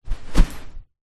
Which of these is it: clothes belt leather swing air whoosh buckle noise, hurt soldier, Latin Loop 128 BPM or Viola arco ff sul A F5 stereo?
clothes belt leather swing air whoosh buckle noise